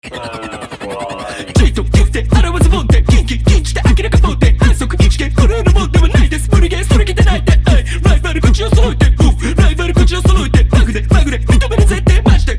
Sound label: Routine Set music; artistic swimming